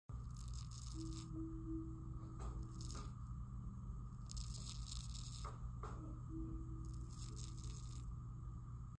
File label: brushing eyelashes